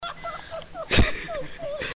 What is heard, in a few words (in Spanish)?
risa